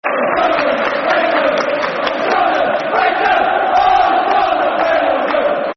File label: We Love You, Football Chant (Soccer Song); Sunderland; Sunderland Safc